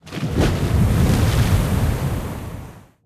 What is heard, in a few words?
submarines engines; World Of Warships